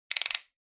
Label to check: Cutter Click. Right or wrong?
Right